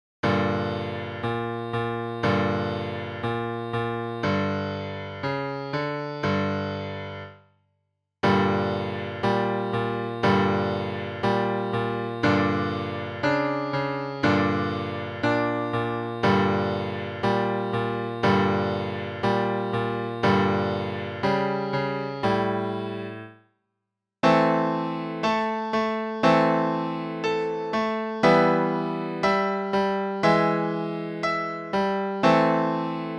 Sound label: piano part; Monster Feet